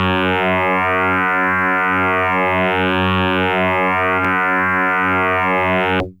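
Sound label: Electric Bass DX100 F#5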